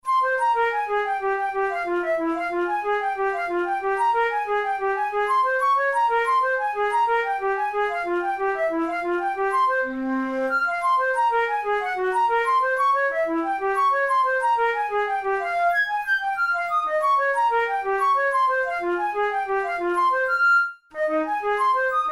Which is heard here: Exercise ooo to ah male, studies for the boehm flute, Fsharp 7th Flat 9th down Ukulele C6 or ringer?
studies for the boehm flute